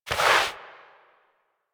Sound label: Crouch Move Foley; Warframe; Tenno